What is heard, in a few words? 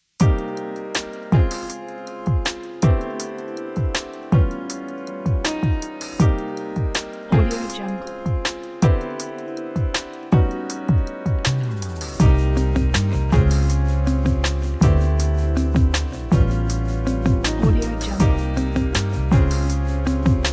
spinning a chill groove